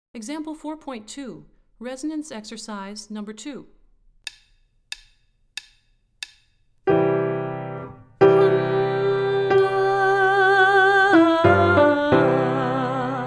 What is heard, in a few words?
Resonance Exercise, warm-ups